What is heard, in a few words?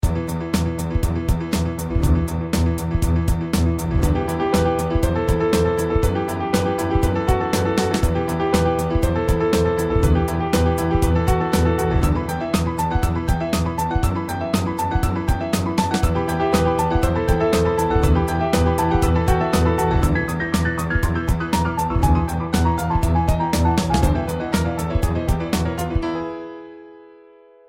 Vicious Piano